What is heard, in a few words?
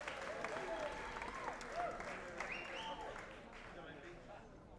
applause; Live in Washington DC